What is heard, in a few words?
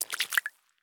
walk puddle; Escape from Tarkov